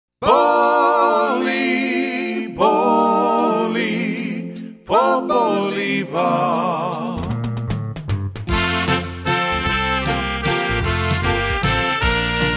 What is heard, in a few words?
headache waltz